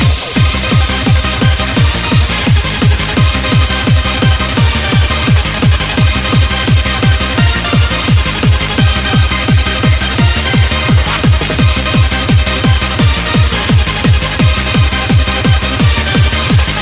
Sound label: hardcore trance